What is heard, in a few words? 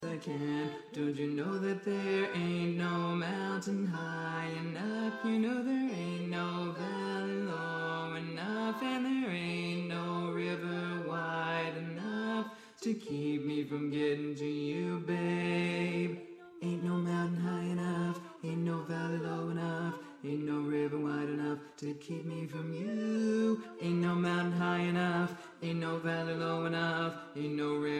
bass